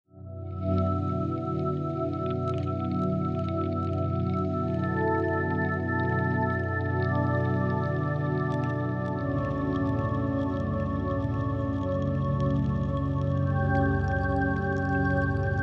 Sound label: ambient